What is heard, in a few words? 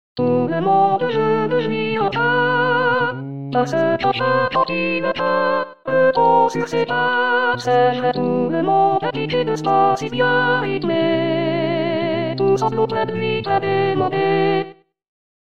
Soprano; Variété